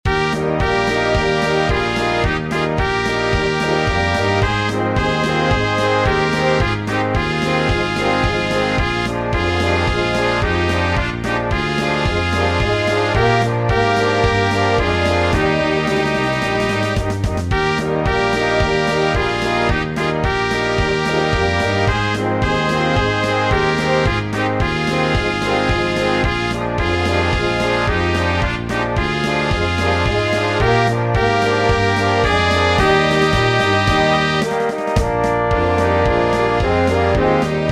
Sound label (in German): musik musik